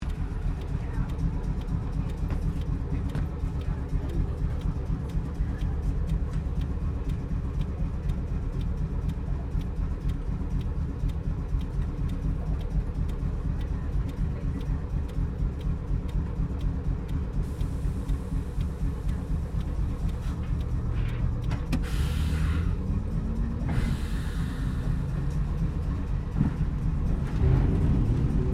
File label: Tram Melbourne W Class Start Stop Medium; Streetcar; Streetcars; Vehicles